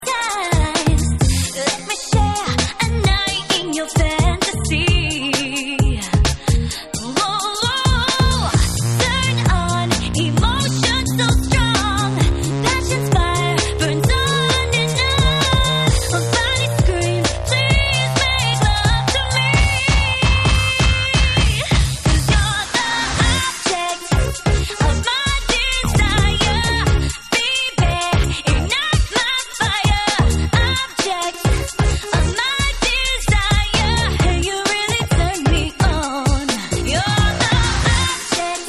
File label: Breakbeat Club Mix